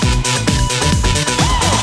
About Box Loop

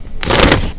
scroll; Sports Illustrated Kids Sports Encyclopedia; Creative Multimedia 1994

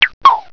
Page Number Click; How Many Bugs in a Box

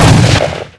shotgun shot; weapons

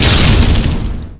small vehicle destruct; specops

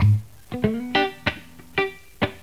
critical stop; PLUS!; Jazz It Up

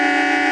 horn loop; Stunt GP

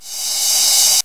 Reverse Cymbal; TG300B; Drums

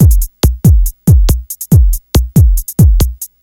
moan, Techno Drums; Techno Music Maker

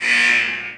damage

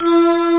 Stringz n Bellz

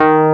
Wurlitzer Hd D#3

